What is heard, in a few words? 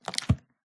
book close paper; PMSFX